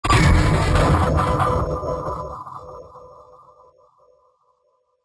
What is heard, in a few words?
Bitcrusher, Impacts Bass; SFX